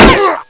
headshot

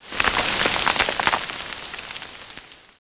sand; czero; de kabul cz

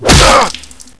knife stab; Sasek; cstrike

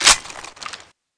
bolt forward, weapons m4a1; mw2

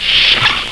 trek door; ambience

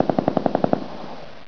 AK-47; de torn; cstrike

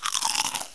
eat; krustykrab

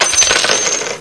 bonus money; bonus platform

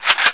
hk416 draw

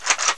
draw; vsk

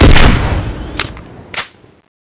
sniper, weapons

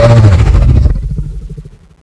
hide hurt, zombie; Zombie Darkness; hidden

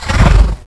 hide dash, zombie; Zombie Darkness; hidden

